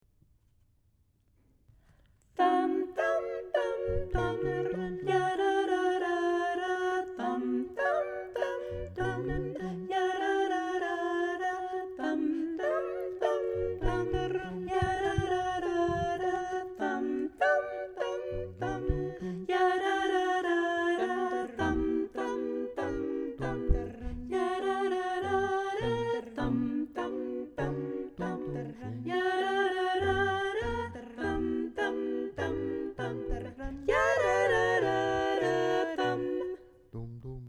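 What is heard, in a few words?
Tango Exercise Soprano 2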